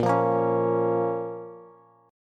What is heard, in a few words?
Bb Minor Bb down Bass; strummed; JGuitar